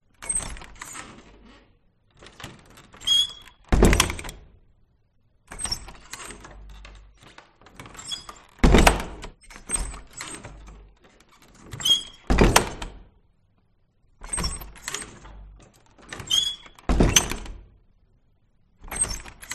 Door Abandoned Mansion Room Perspective Reverberating Old Wooden Door Open; and Close Low; Skyes Audio